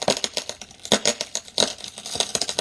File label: freezer creak; hl2